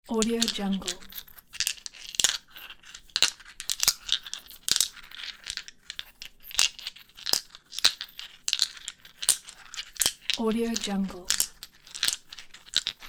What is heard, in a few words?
pebbles in hand